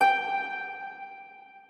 harp

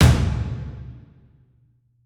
drums

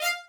violin

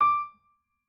piano

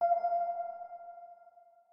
organ

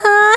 Anon cry G#4; 音MAD; BanG Dream

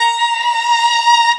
Reed A#6

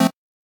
Chord

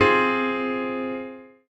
piano

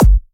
Dr Kick

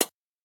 breakbeat

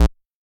bass

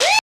vox

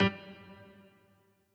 piano riff intro

